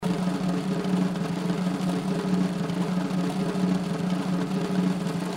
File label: Bonus Reel Spin; slots; Majestic India